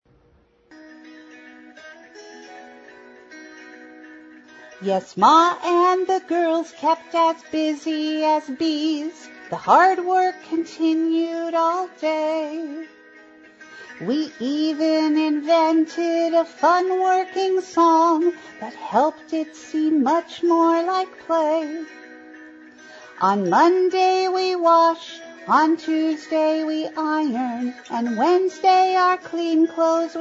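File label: Working Song vocal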